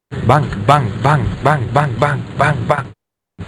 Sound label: bang bang; uboa